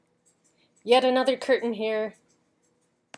curtain; short; TinyGame2; AdventureGame

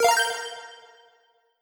crystal pickup; Bomberman

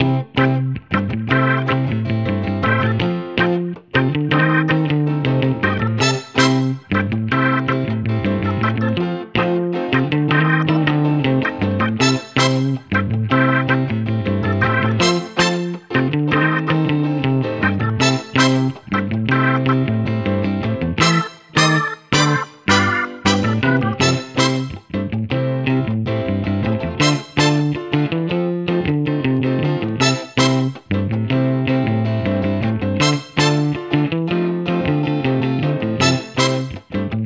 STEMS INSTRUMENTS; Indie-Game-Jam

